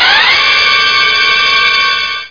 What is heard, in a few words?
thwing